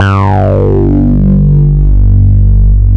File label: TAURUS; Moog Synths; E-MU Formula 4000 Series Vol. 3; Analog Odyssey